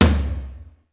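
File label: floor room tom; sr16 tom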